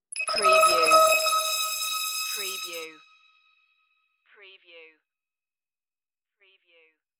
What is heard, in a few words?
crystal interface beam